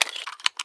357 reload open

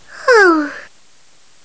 ooh

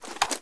gauss spin stop

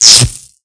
spark; Tesla

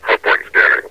hardpoint scanning; scmod; metrocop zombie

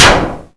hit metal

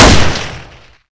ump45

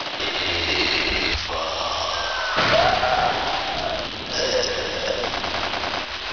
radio zombie

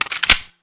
elite right clip in, weapons

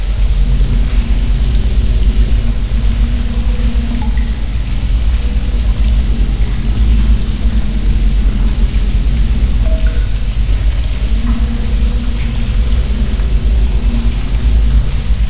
subway ambience

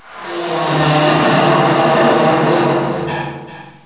steel

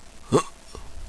jump

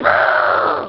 mouth beam; fatbuu

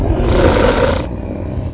Shark Alert

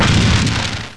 die, weapons

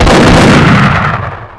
shotgun fire

